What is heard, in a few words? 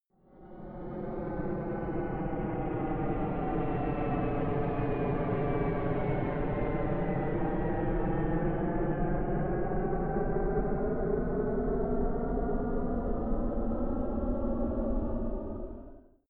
military jet c-17 globemaster flyby fast distant; flugzeuge jets; verkehr transport